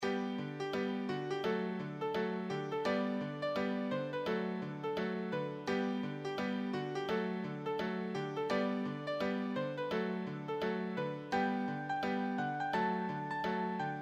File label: solo, piano